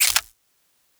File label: Weapon Foley (Laser)